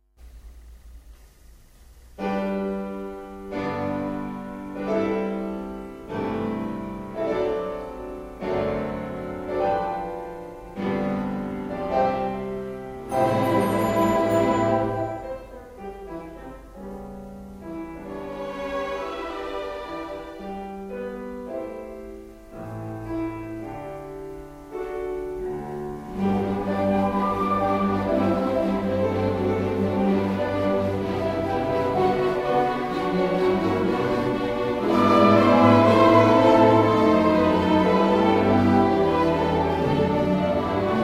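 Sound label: Senior Orchestra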